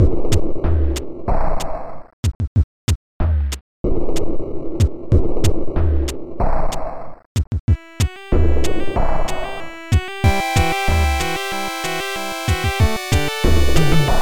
Gestation (Internal Percussion)

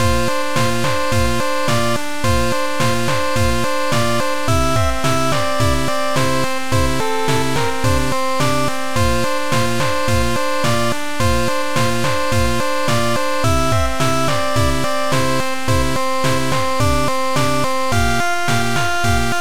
Internal Percussion

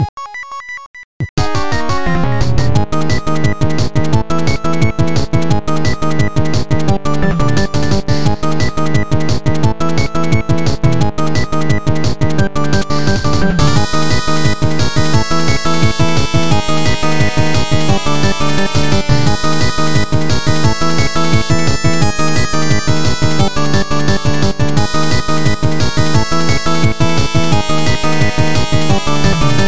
Gravity (Internal Percussion)

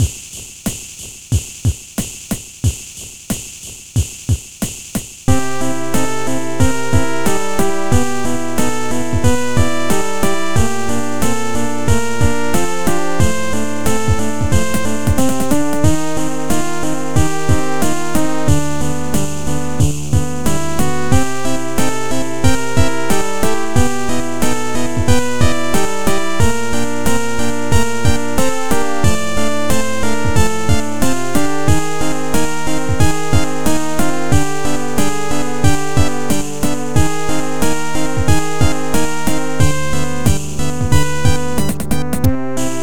Internal Percussion

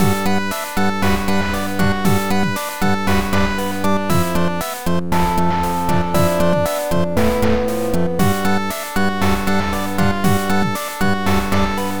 Internal Percussion; Cave Story

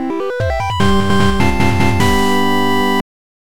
Victory; Cave Story

